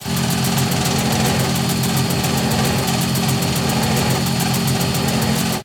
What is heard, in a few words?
ship engines; World Of Warships